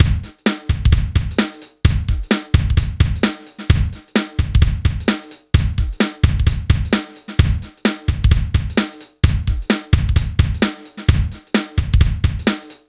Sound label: rock; INIS